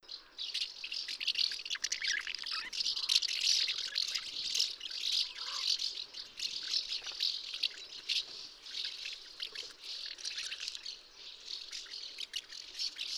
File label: Delichon urbicum, chant